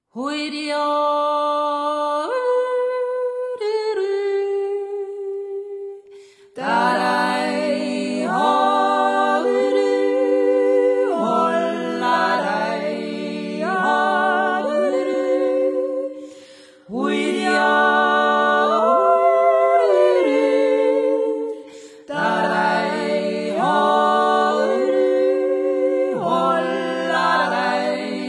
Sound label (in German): Jodler, Dreigesang